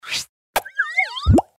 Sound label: Elite Barbarian Kiss